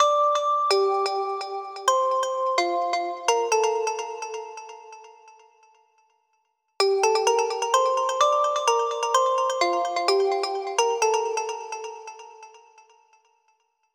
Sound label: Melody; super crush k.o.